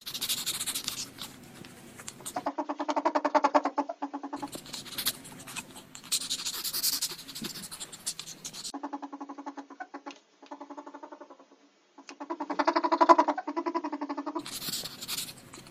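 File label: ferret voice; horek golos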